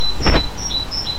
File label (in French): Charbonniere chant